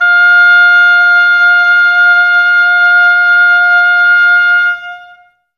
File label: OBOES F, Oboes, Wood Winds; Miroslav Vitous; Woodwind Brass Ensemble